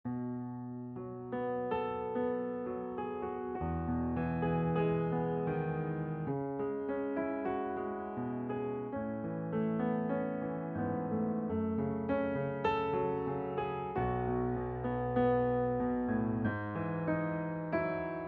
piano solo; New Hope Music

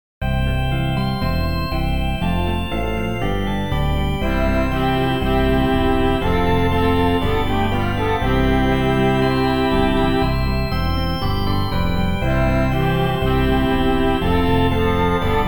instrumental; New Hope Music

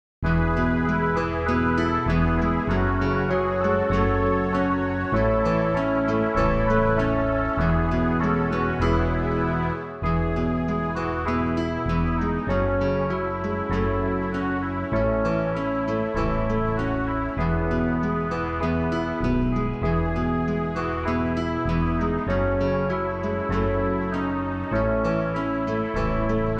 backing; New Hope Music

